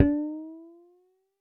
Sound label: U220 FX Set, Drum Machines; Roland U220